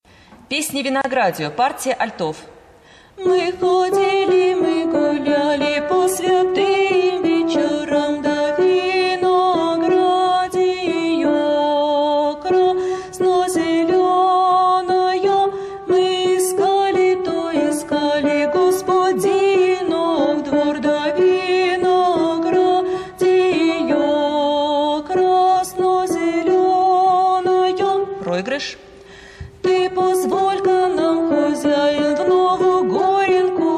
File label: alto; Vinogradie